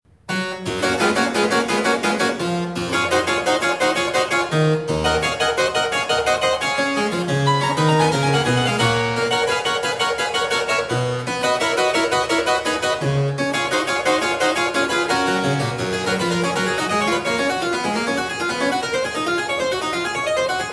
Goldberg Variations, BWV 988 Variatio 29. a 1 ovvero 2 Clav; J.S.Bach